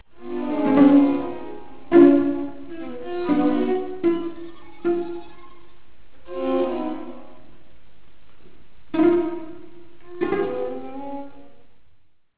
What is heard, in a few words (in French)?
sextuor